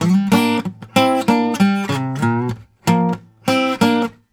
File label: Blues Guitar Samples; ILIO; Fretworks; 96 HIRUM LICK